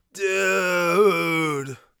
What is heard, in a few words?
death, Warrior Voice; Warrior Voice Pack